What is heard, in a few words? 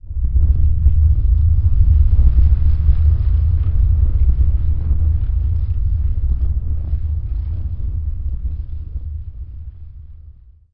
earthquake